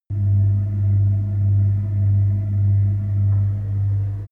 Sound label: fridge running